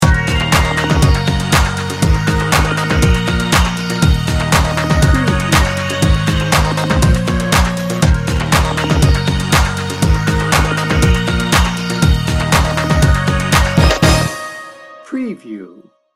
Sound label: slinky beats